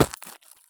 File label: landing gravel; Escape from Tarkov